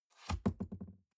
cardboard box open unfold bounce; objekte

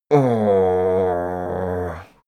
male grumble annoyed; menschen; koerpergeraeusche; soundpool

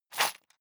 sundries shake hard; berufe; handwerker; soundpool

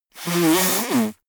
nose blow; comedy cartoon; koerpergeraeusche

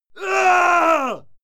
male fight moan heavy; schreie; menschen; soundpool

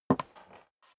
step dress boots wood creak single right; schritte; Footsteps; menschen

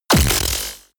sci-fi shock blast

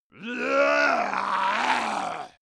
monster male growl aggressive; kreaturen

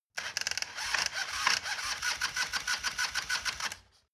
motorcycle harley engine ignition

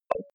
click console enter; signale

